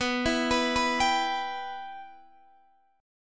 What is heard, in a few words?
B Suspended 4th Sharp 5th, Guitar, DADGAD